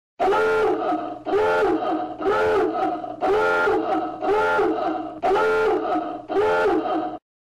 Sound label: alarm u-boot ringtone